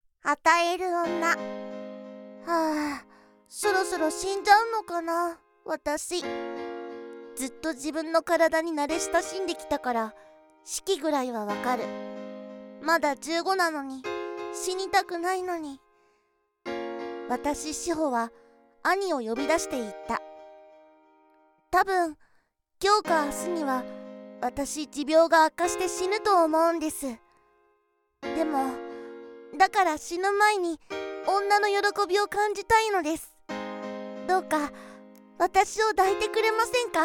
ボイスドラマ 喘ぎ声ループver; 与える女

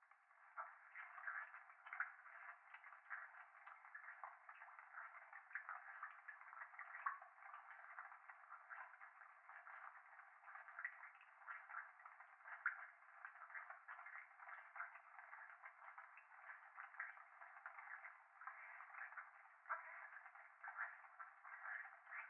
風呂 フェラチオ

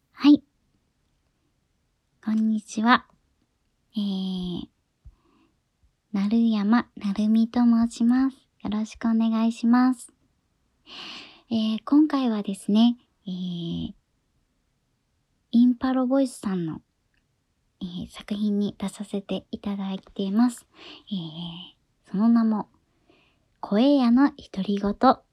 プライベートオナニー実演; 声屋のひとりごと

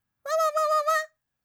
システムエラー

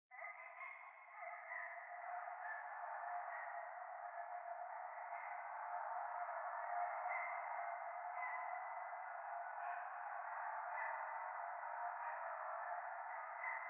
廃墟 喘ぎ 車の中

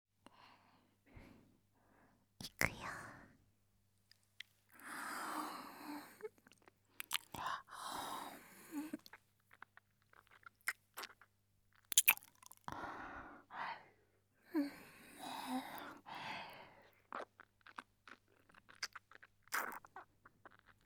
耳舐め, ASMR; SEなし

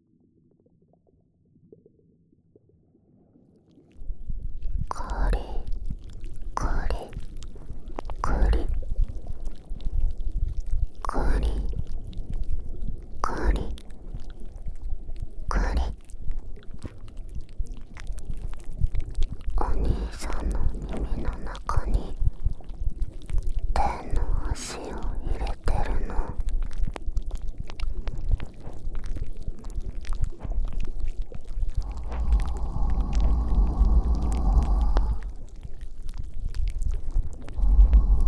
安息触手耳かき, ASMR

